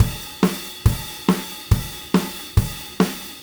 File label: Drums Rock Chorus, loops; Alex Sanfilippo